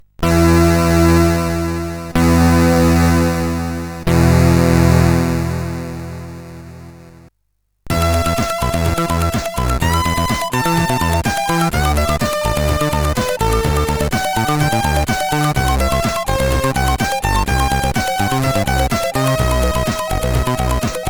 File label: Ice Age, CSG8580R5